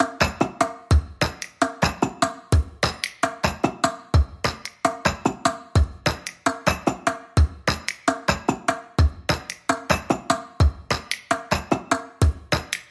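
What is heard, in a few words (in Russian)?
beat percussion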